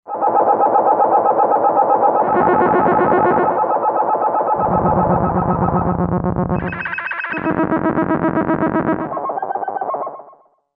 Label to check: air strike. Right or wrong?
Wrong